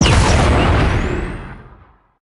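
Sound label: power portal; smite; heimdallr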